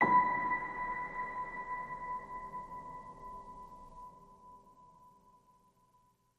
Piano Ping B

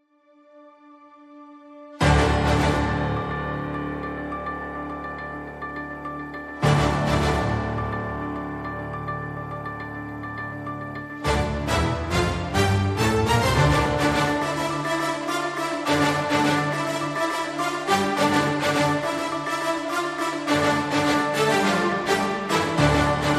No Drums No Bass No Trailer FX

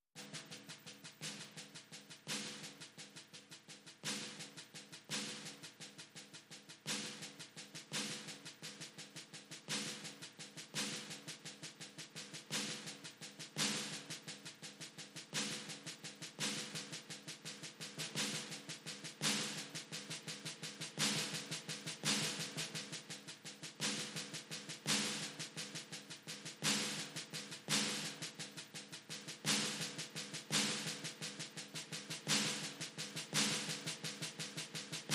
Drums and Bass Mix

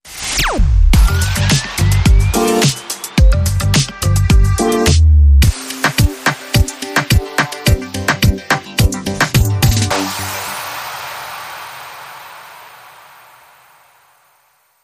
Vox Radio

